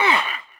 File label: grunt light hurt